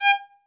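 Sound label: solo violin, strings; ILIO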